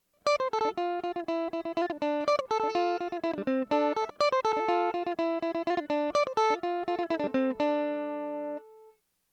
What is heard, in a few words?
mandolin comparison electric tb